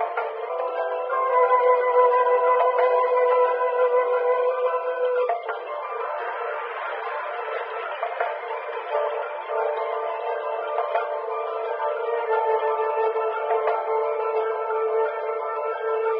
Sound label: flute, Loops